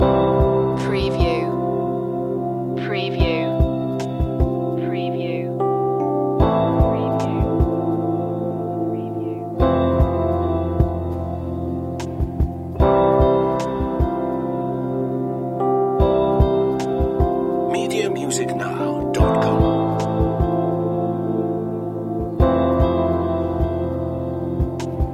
loop, Ambient Chillout, A chilled and ambient track with simple electric piano